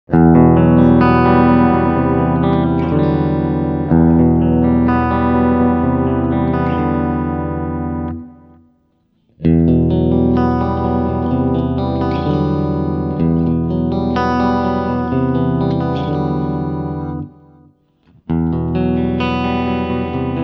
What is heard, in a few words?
Pickups from rear to front